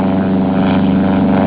BIPLANE; SkirmishPAL

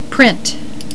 PRINT

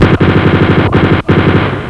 SHOOT

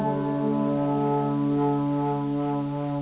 FLUTE VI

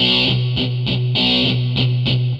GUITAR, ROCK POP; MAGIX

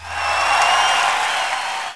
WIN

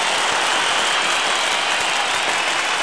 CHEER

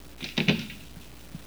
SHWR OPEN

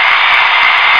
CIRC SAW; JOHNNY

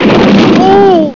boom oooh

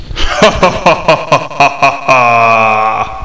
BIG LAFFS; HUMOR

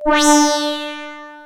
HI HORN; BEAT2000

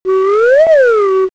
spin

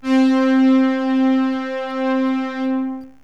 JUPITER, SYNTH; JUPITER6; cubase; CUBASIS

